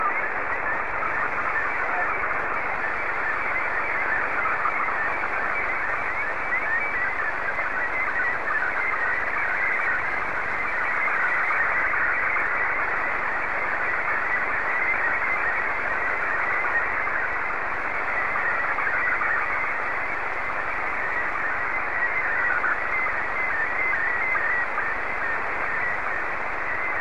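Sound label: Scottie 1, slow-scan television; FG6QOM; SSTV; VK4MSL SSTV RX @ QG62ln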